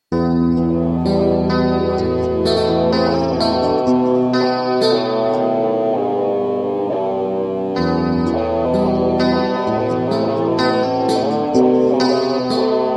instrumental solo

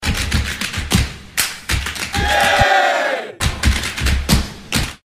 Spanish dance

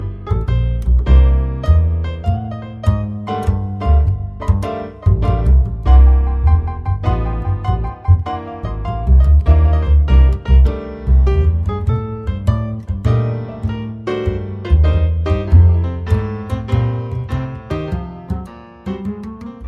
piano and bass jazz

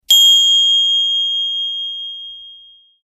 ding tone

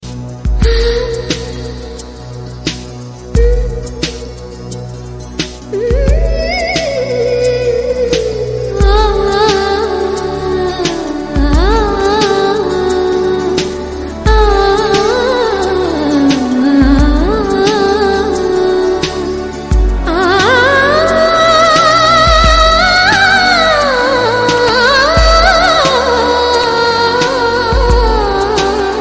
nice humming; Indian

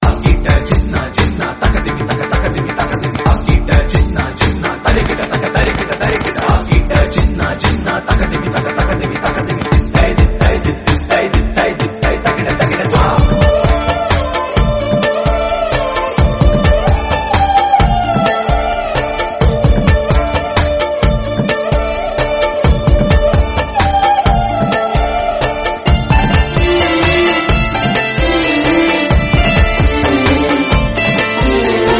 sarangi, dancing

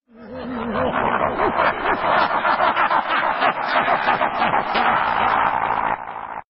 devil laugh; Diablo II

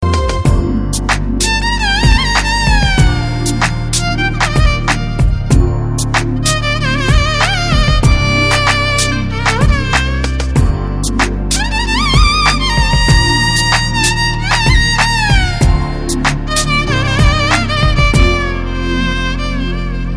violin bit; Veppam; Indian

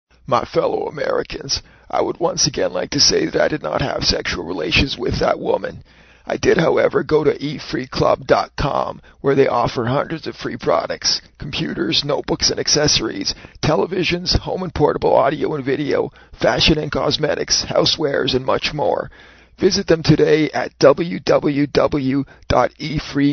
funny true ring tone ringtone

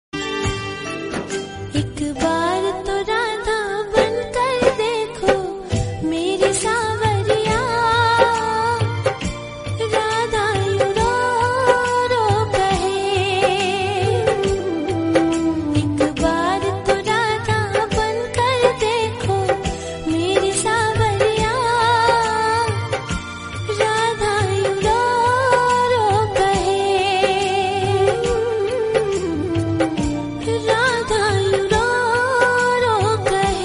sad tone; Radha

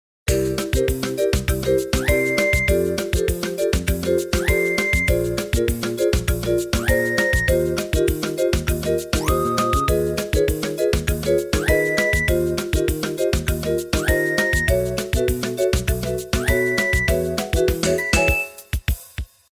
Nokia N95; Oceanic

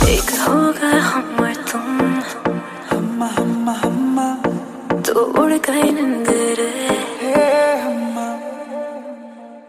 female ringtone; Bollywood

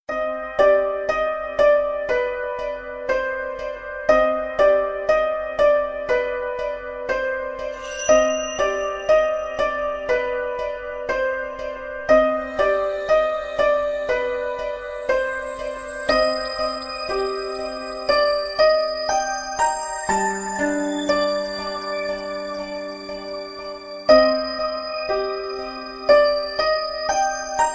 mysterious atmosphere; Electronica; Vampire Knight

